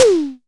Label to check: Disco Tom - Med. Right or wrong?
Right